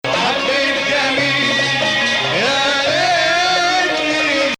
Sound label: Bayati Ah Ya Hilu Ajam